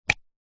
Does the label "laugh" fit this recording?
No